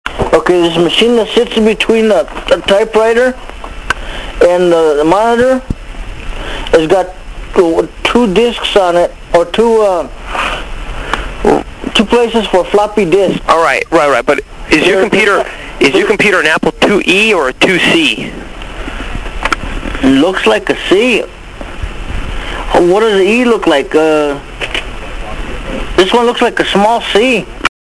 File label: Hit The Bong